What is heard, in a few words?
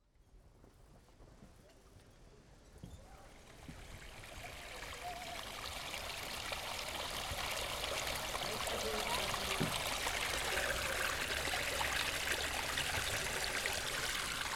walking quiet; waterline